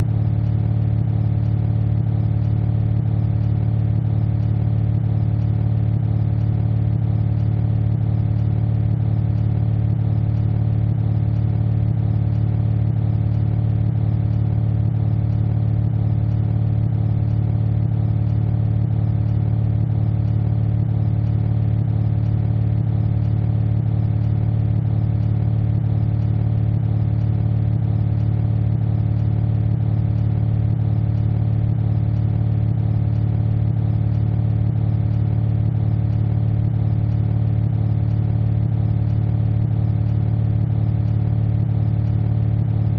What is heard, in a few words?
engine ambiance; BALLY; CORVETTE